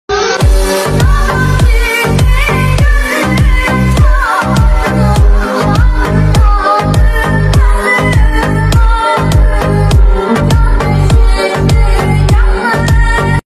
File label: bass